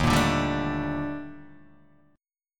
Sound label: Eb Major Sharp 11th Eb Guitar Open D